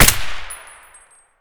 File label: sks silence; Tacz1.1.5+; RSWS 1903